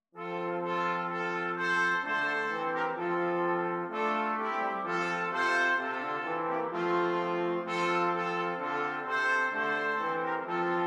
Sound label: Brass; brass quartet